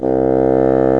WINDS & BRASS

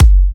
F#, Kicks; markus hakala

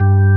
STOP ORGAN, JV 1080; Pro-Rec - Synthsonic

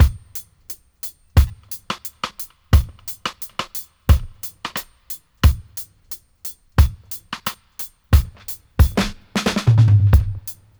88 dry, drum loops, dub; 088 BPM; Drumdrops In Dub VOL-1

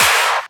Claps; Vengeance; Essential Clubsounds Vol.4

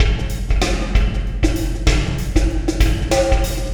Wormhole Beat, Processed Drumkits

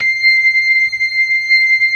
ORGAN, LOWER MANUAL

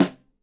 Snare, EMU PX-7 Drum Machines; 300 Drum Machines

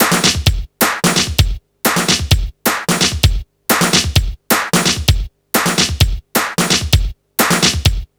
techno; INIS; Funky Xtreams

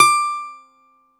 BALALAIKA, Russian Strings

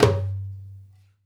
Dumbek; Essential Percussion

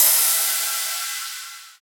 Rides, Cymbals

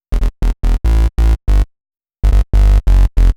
Bass, uk garage, 142bpm; Szeet

